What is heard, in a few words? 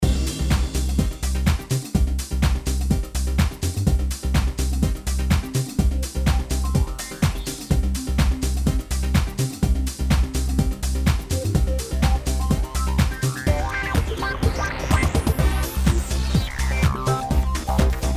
Fire Music; tetris elements